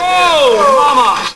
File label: ROB NOISE